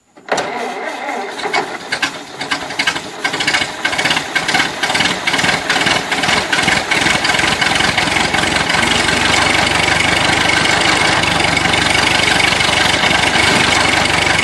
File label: old car engine; daniel simion